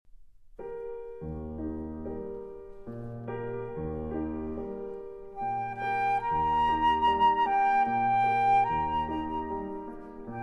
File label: Wiegenlied, for Flute & Piano; 5 Lieder, Op. 49 No. 4